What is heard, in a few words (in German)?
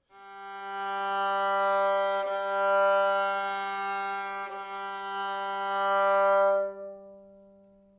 stimmton g; Geige